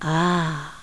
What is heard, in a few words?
ahh; VEGAS Girls